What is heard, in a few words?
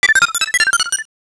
shockwave